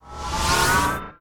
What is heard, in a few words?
boss laser load; spider-man-mysterio-rush-game